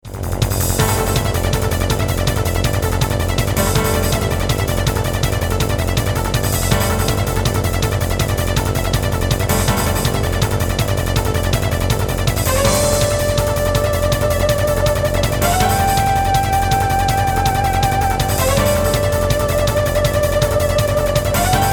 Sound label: hyperbeat, club